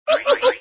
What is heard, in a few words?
emotion giggle; wanNingTaiQiu